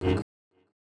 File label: Lightsaber; Adium